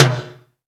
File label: TIMB GATE L, TIMBALES